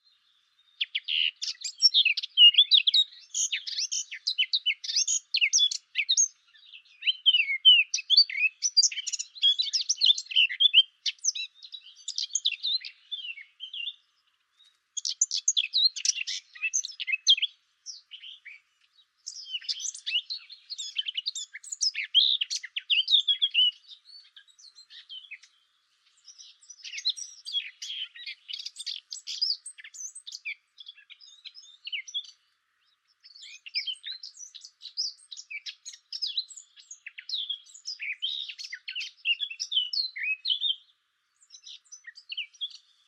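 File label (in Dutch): zwartkop, blackcap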